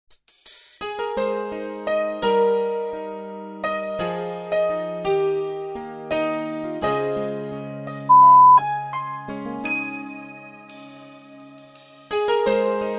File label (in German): Klavier Balladen